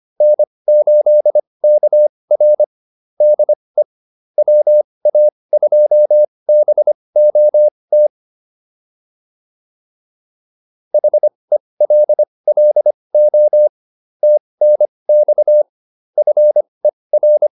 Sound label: QSO, advanced practice files